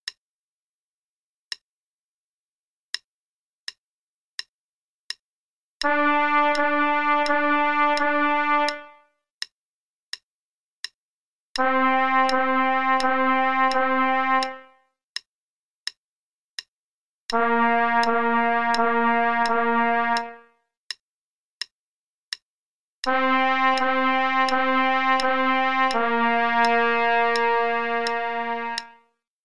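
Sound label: Play-a-long, quarter note = 84, trumpet; SOE Bk 1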